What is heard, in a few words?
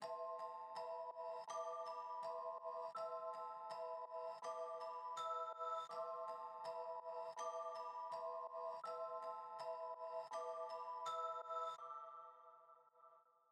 Dark Melody Loop 163 BPM A Min Bells; Melodies; Loop Stems; Cymatics; Oracle Sample Pack